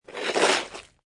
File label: Snow And Ice Ice Scrape Moderate; Weather